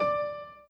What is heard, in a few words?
Piano Chord